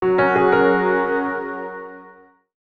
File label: Alert; Tyrannosaurus Alert; Sony Ericsson; PlusFourSix; Tyra (G900)